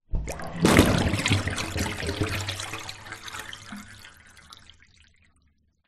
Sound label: household plug pulled bath shallow water drain gargle